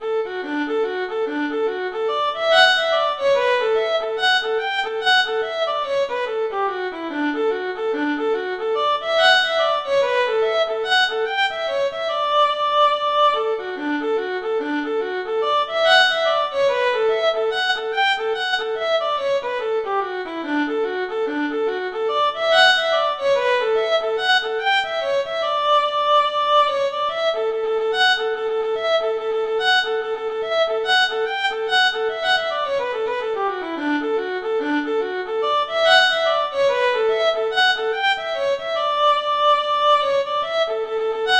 the harvest home, flute

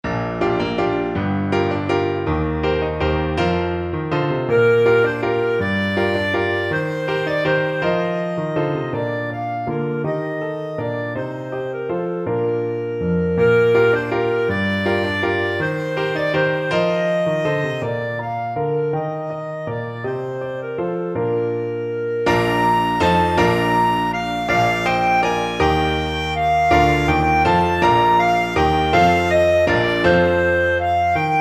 lilliburlero, clarinet

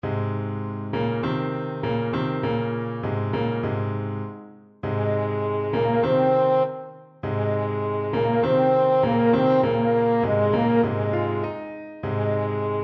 olele moliba, french horn

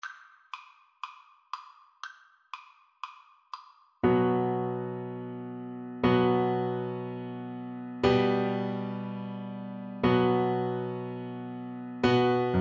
hava nagila, Bassoon

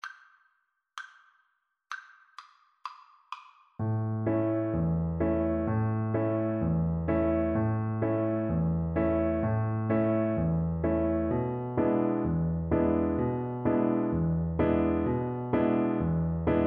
rain, Violin

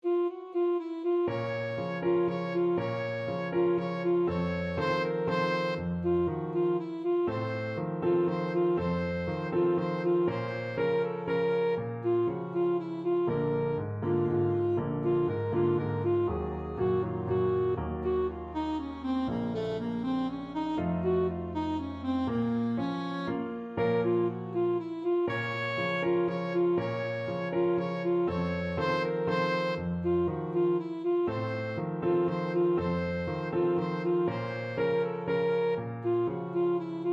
la partida, saxophone

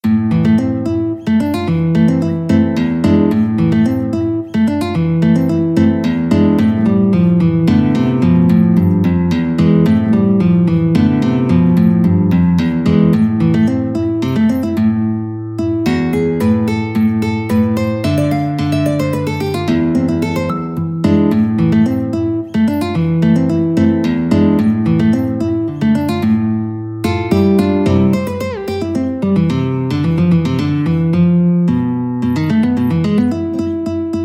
bolero, guitar; arcas

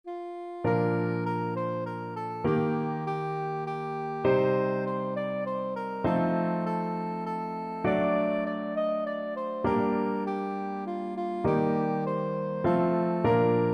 we wish, soprano saxophone

